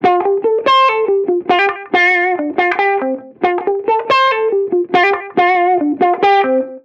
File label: Reggae Guitar Loop 140 BPM F Maj; Vibrations; Cymatics; Vibrations Guitar Sample Pack